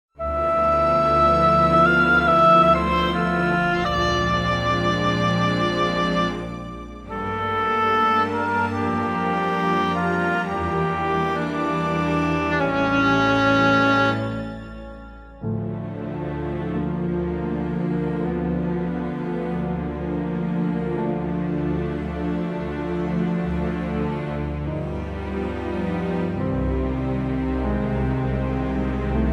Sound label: Orch; key - Bb; Superb Orchestral arrangement